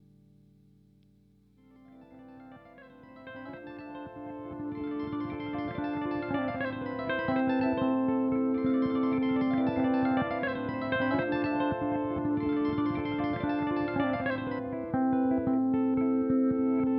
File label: Timeline Lo Fi; Strymon